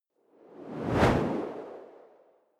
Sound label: whoosh simple hard high